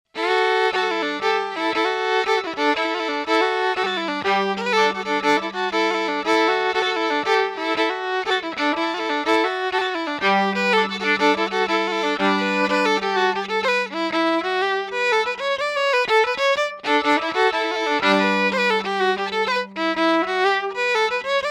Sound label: Violin